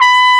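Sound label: CORNET C5; AKAI S-Series CD-ROM Sound Library VOL-2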